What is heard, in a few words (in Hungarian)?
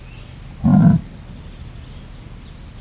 egyet mordul, leopárd; jaszberenyi zoo 2015